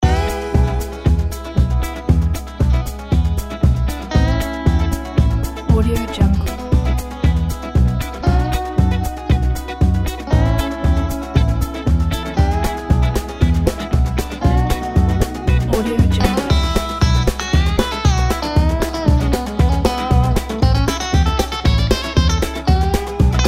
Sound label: country music background